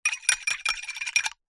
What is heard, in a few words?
food drink metal spoon stir water in drinking glass short